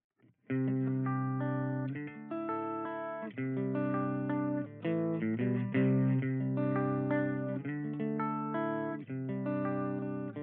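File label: DUAL CUBE US Combo dry, guitar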